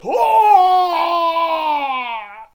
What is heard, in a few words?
samurai shouting; jidaigeki